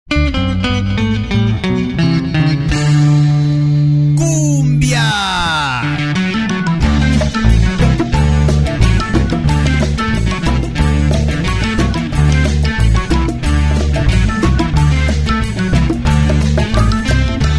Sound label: lil cumbia